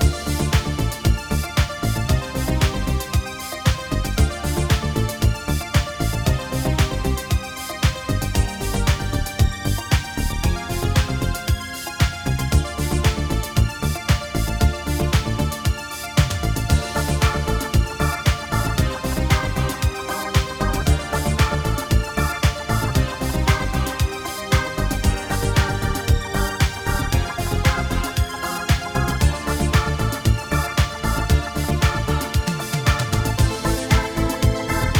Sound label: Monsters Mashin Next Door, funny